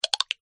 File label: Bet Up Many